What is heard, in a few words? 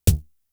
Snare, Drum Machines; Fricke Schlagzwerg